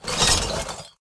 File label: panel close; Lieux-wow